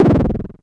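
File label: Klone Dual Percussion Synthesiser noise; KLONE FX NW8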